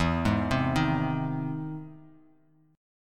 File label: E Augmented Major 7th E down Bass Standard; E+M7 arpeggiated; JGuitar